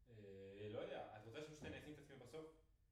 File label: Piano Room